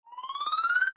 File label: Infographic data chart expand